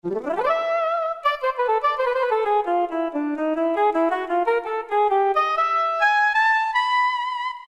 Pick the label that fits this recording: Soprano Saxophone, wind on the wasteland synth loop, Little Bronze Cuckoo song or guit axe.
Soprano Saxophone